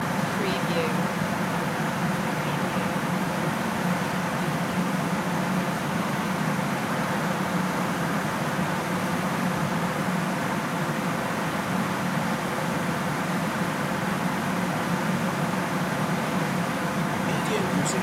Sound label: backyard air condition loop